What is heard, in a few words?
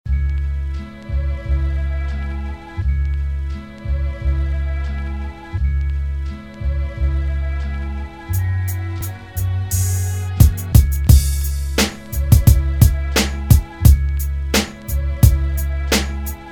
Real Hip Hop Sample